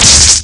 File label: Hit Chain; A player getting hit by Sheik's Chain